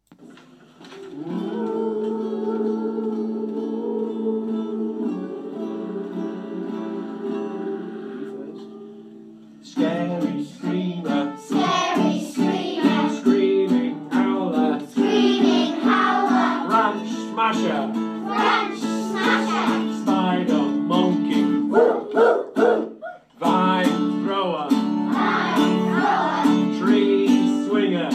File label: Rainforest song